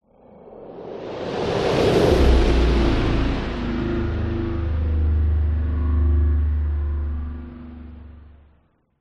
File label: sound design whoosh horror whoosh into robotic drone; zapsplat